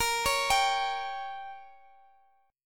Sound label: A sharp Minor 6th A sharp, Guitar, Standard; arpeggiated; capo 3